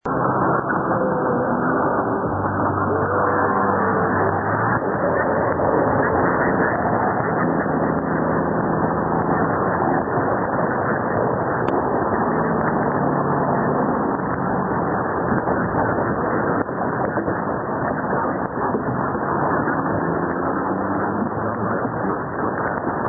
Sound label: Germany; 756 Deutschlandfunk